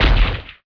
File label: shot; BESTSHOT; noisybit